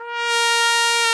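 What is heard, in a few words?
brass, Tpt Solo; Bach; Roland L-CD702; Tpt 1-4 Solo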